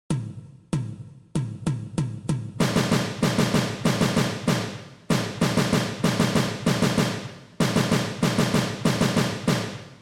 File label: Taps - Diddles @ 96 bpm, percussion; percussion methods